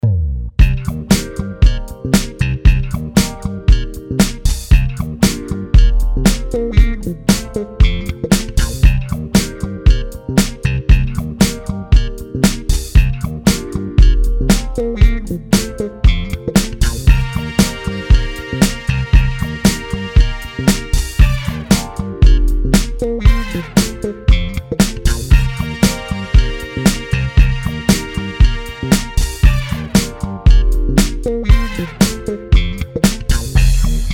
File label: happy Jingle long